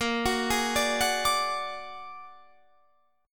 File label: A sharp Augmented 7th; x,x,20,23,20,21,20,23